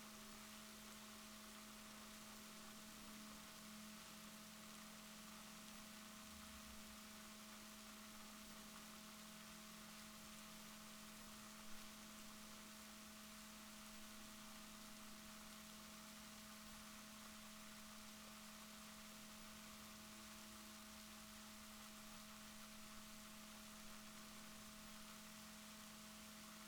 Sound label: rorquals positif, Balaenoptera Physalus Chile